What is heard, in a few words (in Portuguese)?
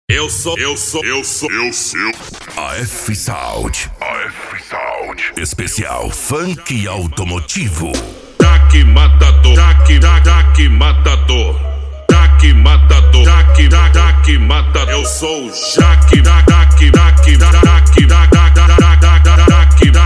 esp funk automotivo; afsound